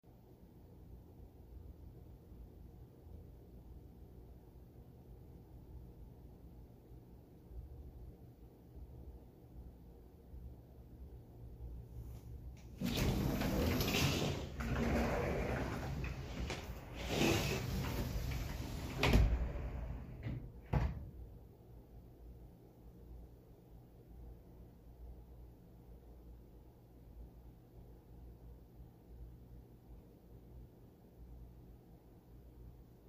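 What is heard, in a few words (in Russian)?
doors, open patio doors, go out, close doors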